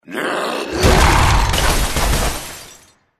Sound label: attack tusk icicle